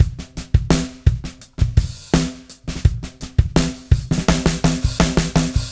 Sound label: Beat Loops; Zero-G; Monster Beats